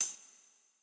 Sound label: JF Tamb, 140bpm, Grime; Zero-G Sound Sense Grime Scene; GS Construction Kits; Just Flex